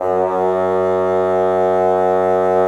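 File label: wind bassoon; LA Composer vol. 3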